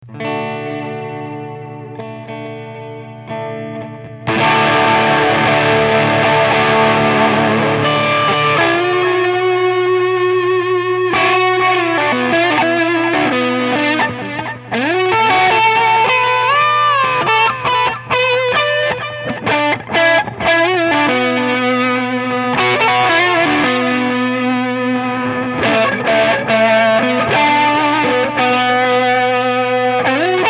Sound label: Solo; TopFuel